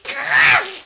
female scream; Dark Village

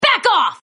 win; april; Power Rangers vs Teenage Mutant Ninja Turtles - Ultimate Hero Clash 2

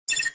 correct; Ben 10 - Alien Catcher

